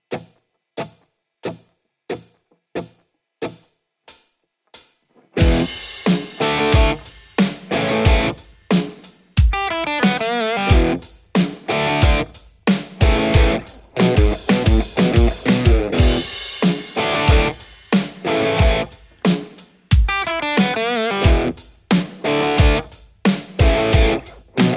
lespaul legion; poulin

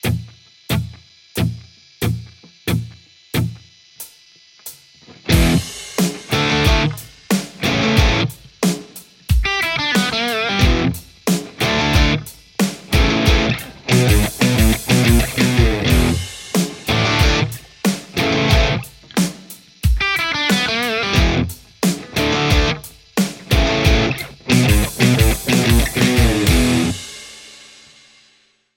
lespaul JCM800 hot; mercuriall

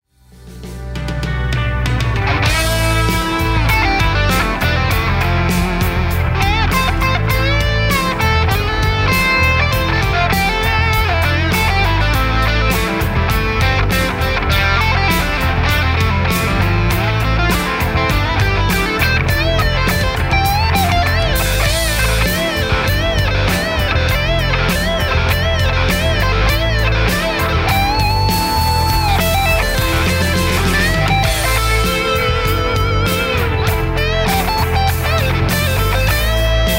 lespaul amplistortion

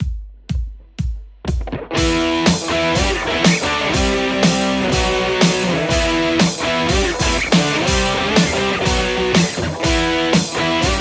white strat; igniteamps; emissary v1 00

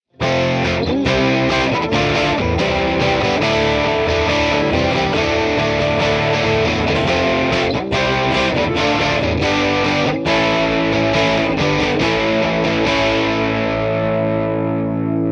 tube chords vintage boost; ampsims; nickcrow

